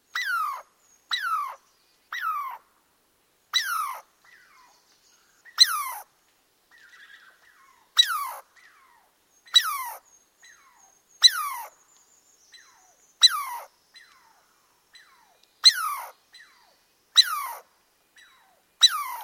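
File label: voice squirrel; belka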